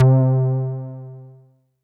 Synth C, Synth Prophet One Shots; Electronisounds

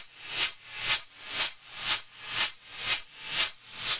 REVERB, SHAKR 120BPM; Bolder Sounds - Granular

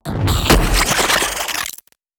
impact thing

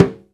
muted Timbales; E-MU Producer Series Vol. 2 – More Studio Essentials; Composer; Timbales Xswitch

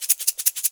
MULTI EGGS; Best Service; World Colours

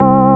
DM ORG1, Dance Mega Synth; Best Service; 90 s Sample CDs

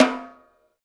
St Snare Wires Off, Roland BK-5; Snares

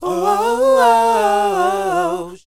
SHOO B, C, Vocal Planet R&B & Dance; ILIO; Vocal Planet VOL-4; SHOO BE 80

